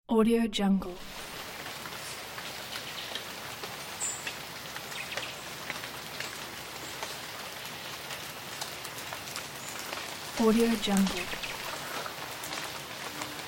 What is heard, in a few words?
rain forest